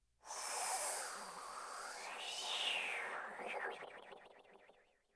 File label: breath